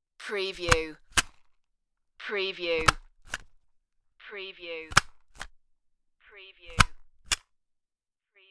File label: hole puncher